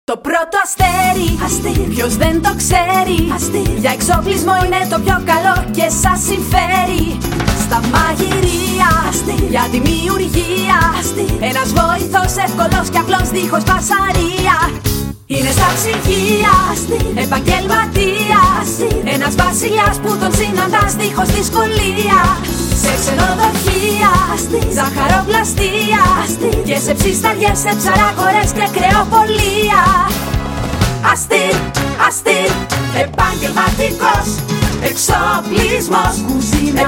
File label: Full Jingle; Astir